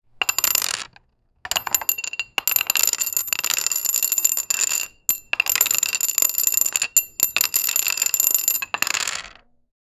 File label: poker chips falling f